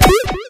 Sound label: hitsound retro